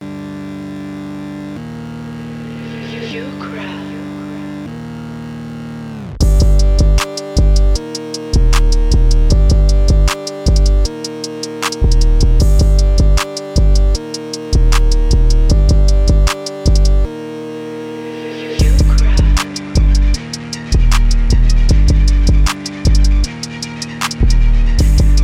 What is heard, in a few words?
155bpm Gm